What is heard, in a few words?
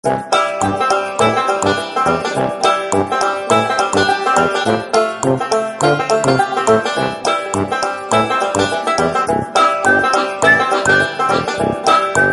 funny farmer